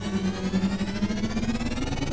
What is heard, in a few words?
speed up short, Shuttle